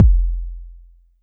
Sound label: BD; TRX